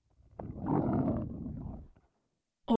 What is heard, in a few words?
creature growls deep burbly breath grumble